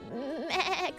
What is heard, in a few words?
Meeeh